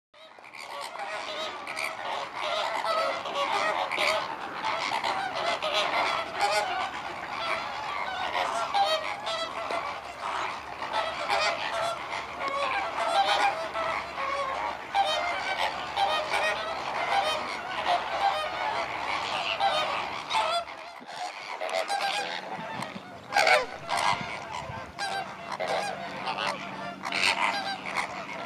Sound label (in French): La cacophonie des pattes roses